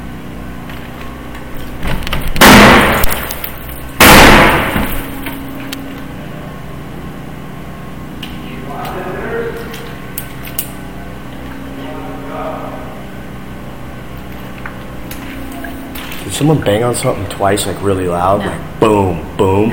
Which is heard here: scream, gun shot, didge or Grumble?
gun shot